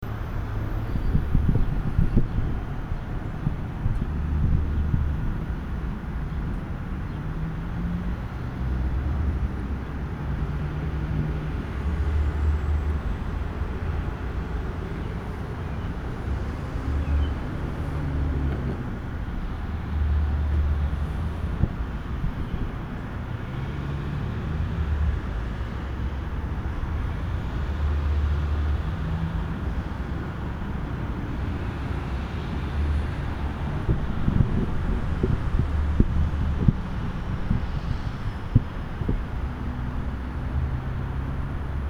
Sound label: Afternoon spring thunderstorm in Akron